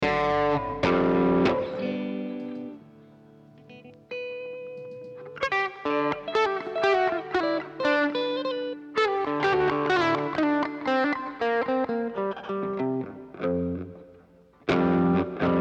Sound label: Strings